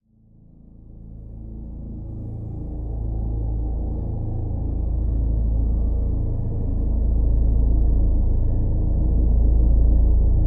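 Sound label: Drone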